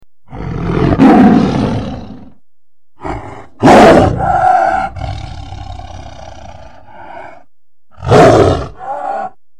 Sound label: tiger; افکت صوتی